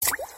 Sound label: Stone Fall Sms